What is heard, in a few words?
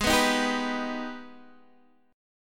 Ab Minor Major 7th, down, Guitar, Open D